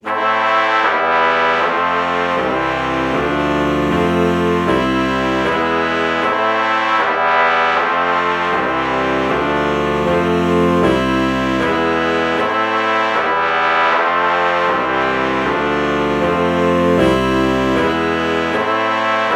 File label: alto saxophone trumpet chord morph